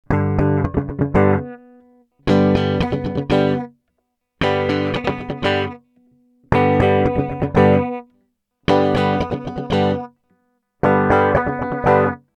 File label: basslab guitar